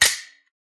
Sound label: gun closing; soundscrate